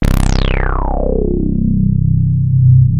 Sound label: Prophet 5 C2; Hollywood Edge - Giorgio Moroder Rare Synthesizer Collection